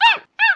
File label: roween attack